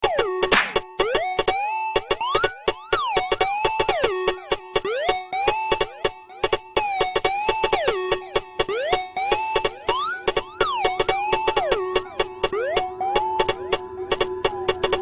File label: Club Mix